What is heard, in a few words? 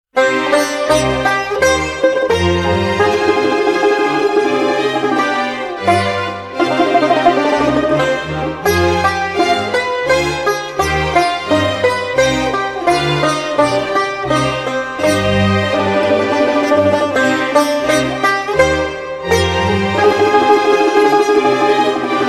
Menuete